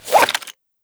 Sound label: draw, wpn; svencoop; arctic incident; ins2